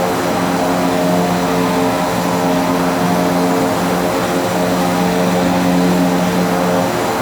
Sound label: Tu95 Front Engine; DCS